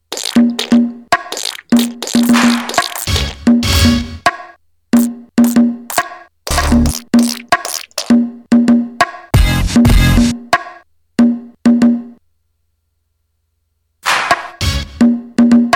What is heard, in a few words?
fart bongos; alphabetical